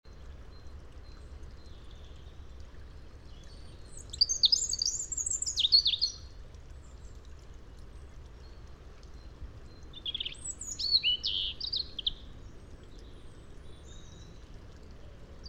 Erithacus rubecula; SETE

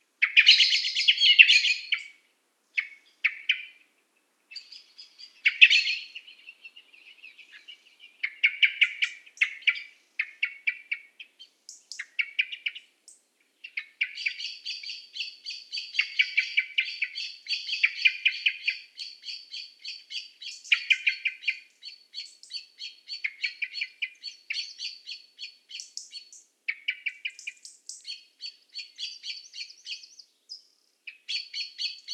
Turdus merula; SETE

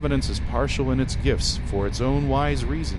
noisy

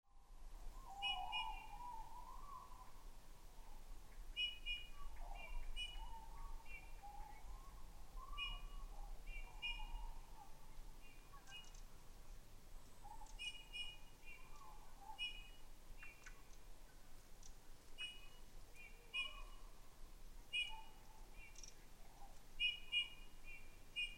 Pyrrhula pyrrhula; SETE

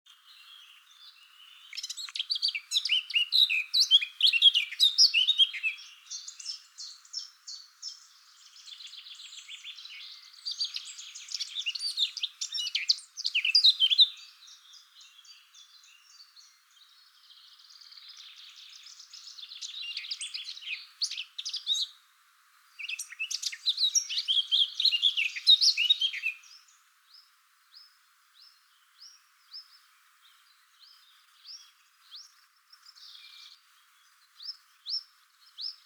Sylvia atricapilla; SETE

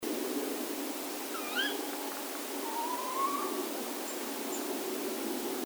Garrulus glandarius; SETE

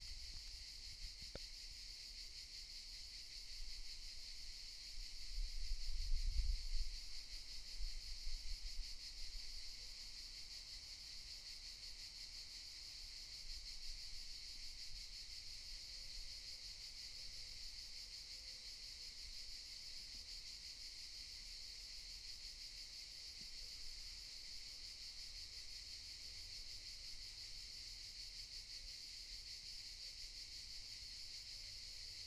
CICADA, Toulon Var

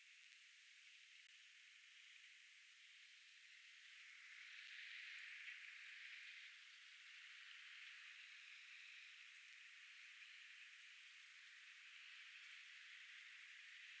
DRONES

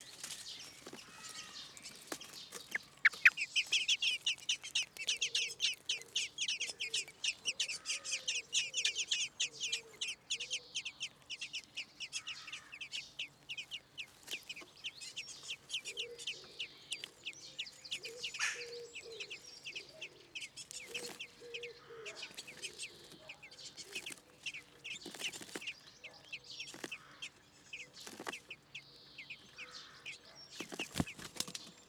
Turdus merula; SETE

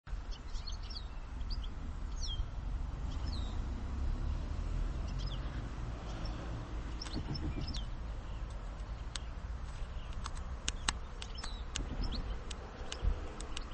Carduelis carduelis; SETE

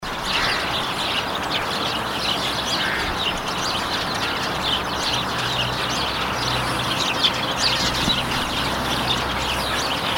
Passer domesticus; SETE

